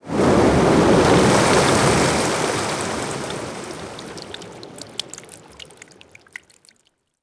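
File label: waterfall large cutoff; water splashes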